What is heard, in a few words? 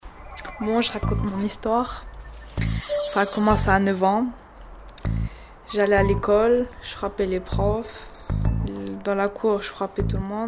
instru